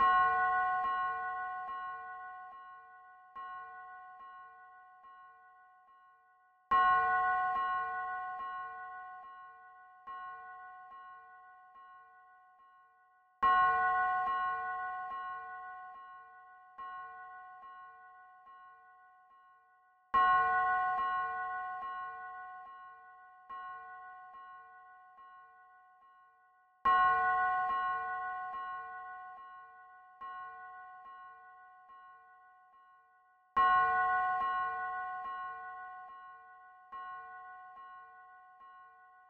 143 BPM F# Min FX Bell; Melody; Trap; Loop Stems; Cymatics; 2022 Melody Collection; Streets

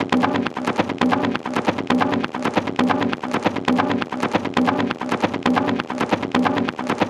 Perc Loop 135, Techno

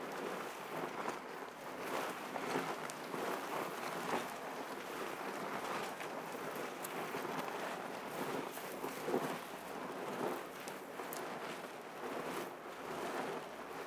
Dragging Heavy Object; Fairlight Sound Lib

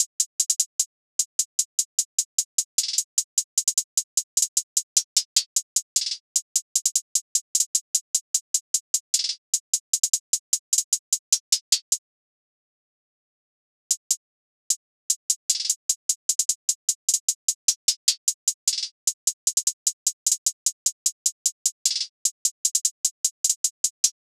Jungle Drum Loop - 151 BPM Hihat; Loop Stems; Cymatics

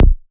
Ashes Bass C#, Psytrance

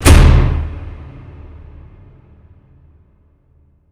impact hatch; Hits

